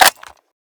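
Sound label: magazine in, fnfal; svencoop; arctic incident; ins2; wpn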